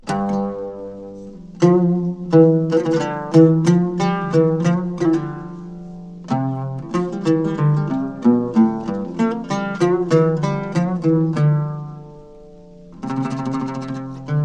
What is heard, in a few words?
samai bayati